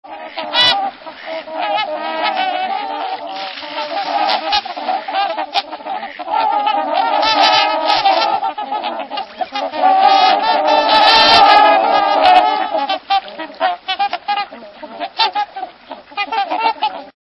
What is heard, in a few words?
Whooper Swan